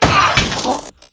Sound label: Robot; Mtt Clicker game